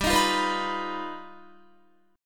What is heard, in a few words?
G Augmented 7th, Guitar; strummed